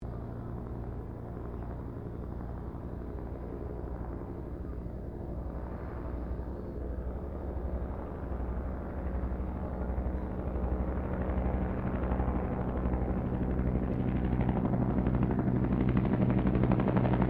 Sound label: HELICOPTER BELL UH1 IROQUIOS PASS LONG; RAN UH-1 Iroguios; Helicopters (Sound Effects:Vehicles)